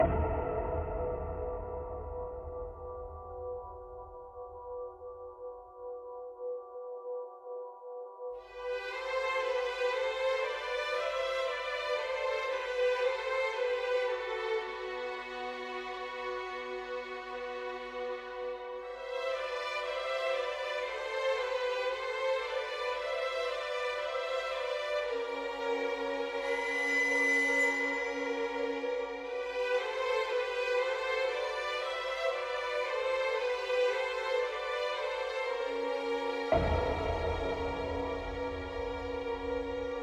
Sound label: Ambient loop; Sandocity